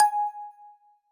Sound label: note, tambourine